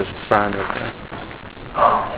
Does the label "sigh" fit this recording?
Yes